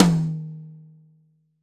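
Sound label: New Jazz Tom High, Roland BK-5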